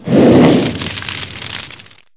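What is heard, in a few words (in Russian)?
fire noisy short hit muffled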